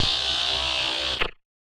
Casio, Glitched, malfunctioning synth

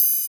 HH HH, Dance

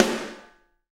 SD Real, Roland; Sound & Vision - Gigapack I

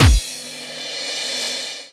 BD; Best Service; Dance Mega Drums

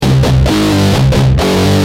G Jam, Guitar; Industrial

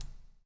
COPS STK; Spectrasonics - Backbeat

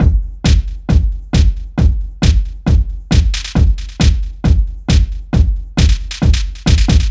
DRM, LOOPS 135, House; Best Service ProSamples vol.10 - House; AKAI

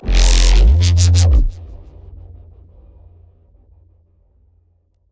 techreese thing

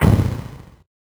VocoBit Noise, 8-bit; musicradar; 8-bit bonanza

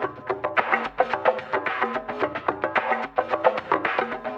APH LOFI ETH, Liquid Grooves; Spectrasonics

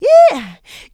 Vocals, Adlibs; Houseworx

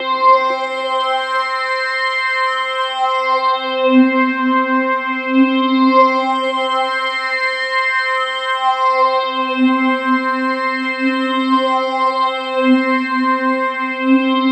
Roland JV 2080 LFO C4; MISSION LFO

